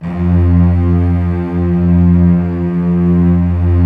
STR SEC, STRINGS; Roland - LA Composer vol. 1

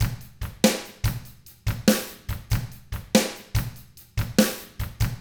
rock 6/8, drums; ILIO; Double Platinum Drums 1

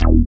BASS, Tekno Industrial; East West

